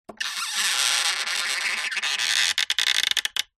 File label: foley wood balustrade turn squeak; zapsplat